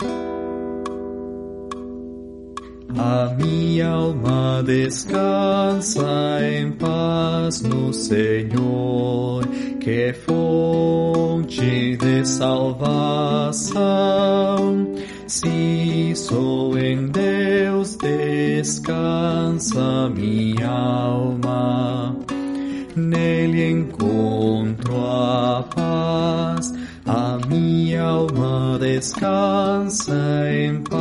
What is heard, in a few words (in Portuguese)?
baixo